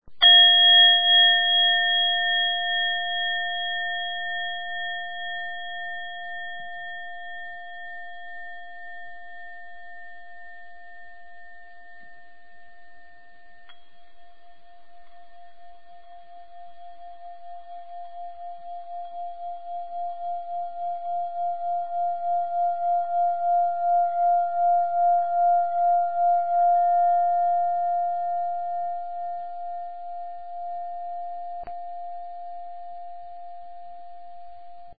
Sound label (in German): zen, Schalen